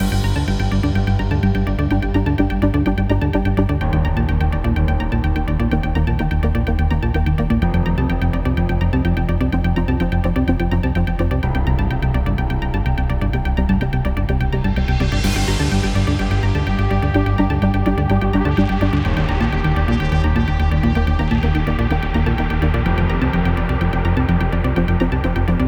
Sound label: tension, horror excitement thriller song; By Burak Sound Bank